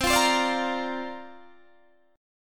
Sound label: C 9th Suspended 4th C down Guitar Open D